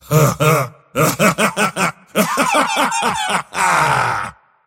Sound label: mars laugh; dota